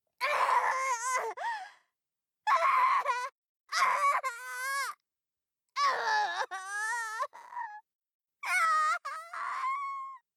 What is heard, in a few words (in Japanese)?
肉叩きハンマー